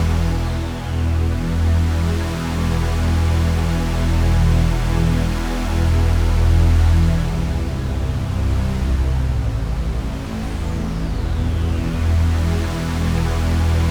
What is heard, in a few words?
138BPM Deep Pad, E minor; Bassline & Melody; Vengeance Samples; Freefall Kit